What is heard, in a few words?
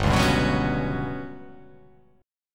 G Major 7th Flat 5th